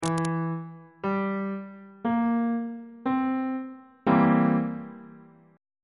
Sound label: b sharp dominant 7th 1st inversion